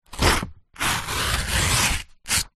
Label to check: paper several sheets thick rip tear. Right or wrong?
Right